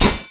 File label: pickaxe Hit